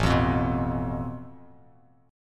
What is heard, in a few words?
A Suspended 2nd Sharp 5th, Bass Standard